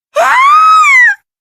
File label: scream